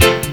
RAGGA HIT, Ragga & Dub Reggae; USB Soundscan vol.27

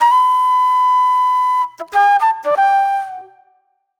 Mellow Flute

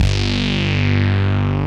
SAW LEAD; Pro-Rec - Synthsonic; TRINITY

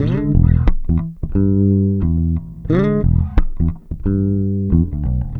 Processed Electric Bass; Sonic Foundry (Sony Creative Software); Modular Electronica; Basses

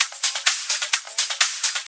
Hihat Loop 128 BPM

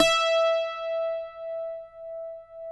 cuatro E; Spectrasonics; Hans Zimmer Guitars VOL-2

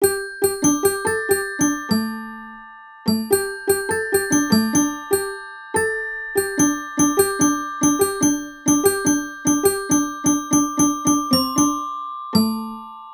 Strings Bells; Sonic Foundry (Sony Creative Software); Cinematic Funk Urban Tone Poems; Session 05 - The Triad

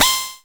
drill, Sound Effects

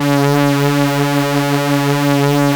3100 C#4, Korg PS3100; Back In Time Records; Korg Universe VOL-1

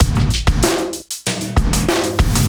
CB LOOP; Zero-G; Chemical Beats

